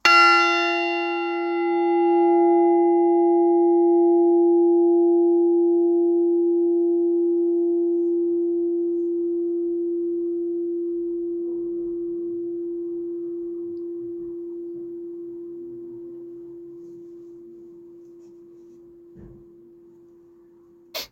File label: bells of sussex; funtington